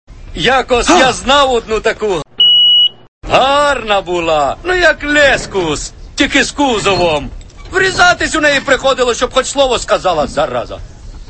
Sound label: beep